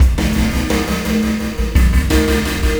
Ripple Perc

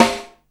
SNARE SK; Back In Time Records; Big Bang

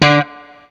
BLU POP, Guitar & Bass

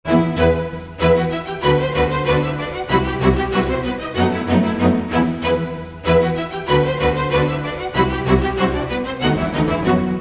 95 e min strings, Orchestral arrangements

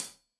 Hat Tight, Hi-Hat, Cymbals; Deeply Sampled Drums

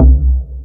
FX Sounds, Drum Machines E-MU Pro-Cussion

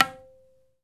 TABLA TONE, Indian Ensemble

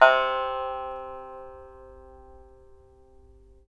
SAN XUAN C4 MF; Spectrasonics; Heart of Asia

